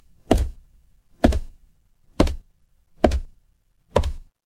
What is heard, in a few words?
footsteps stairs